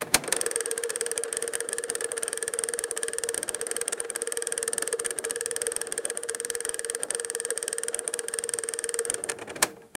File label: clicky counter mechanical; instruments